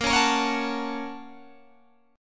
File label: Bb Minor Major 7th Sharp 5th, Guitar, Open G; 20,18,20,18,19,19